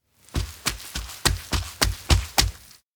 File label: feet rhythm step baker; anemoi